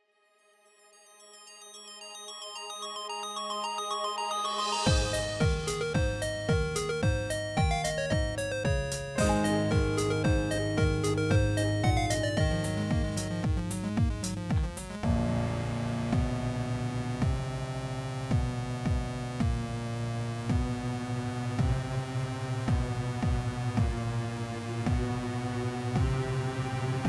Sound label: Moderate, Strings and Synths, 8bit, Happy and Cartoonish